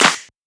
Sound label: Shifted Clap; FLPRO